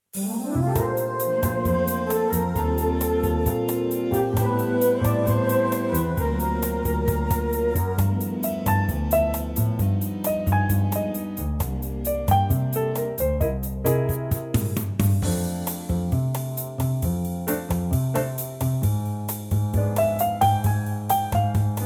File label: F bossa